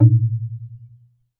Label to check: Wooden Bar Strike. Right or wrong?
Right